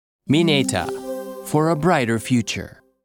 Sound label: Logo; Mineta Co